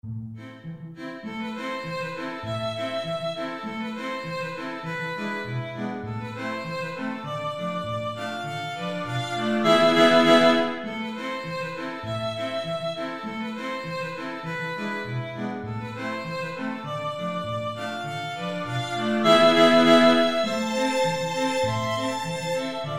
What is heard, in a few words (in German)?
streichquintett